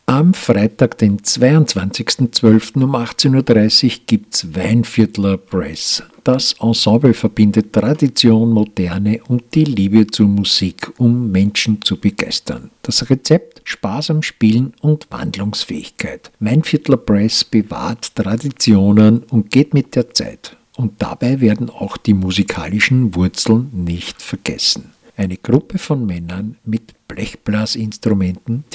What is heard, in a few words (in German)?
Brass Adventmarkt; Weinviertler; Korneuburg